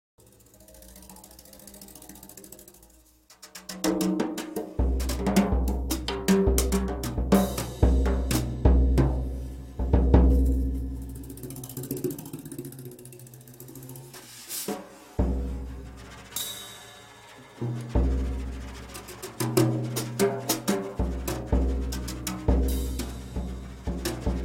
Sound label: drums